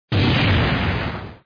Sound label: UI scatter, football; Brazil